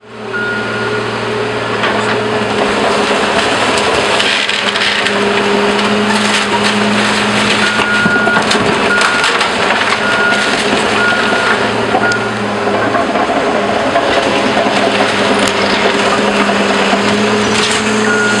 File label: Construction